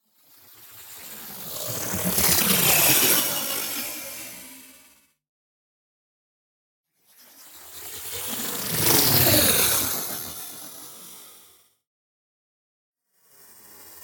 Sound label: NEON WHOOSH SMALL SLOW Electric Ice; boom library